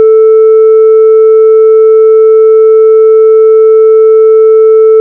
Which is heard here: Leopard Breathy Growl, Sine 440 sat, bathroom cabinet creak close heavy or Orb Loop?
Sine 440 sat